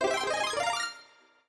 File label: Power Up; SMBW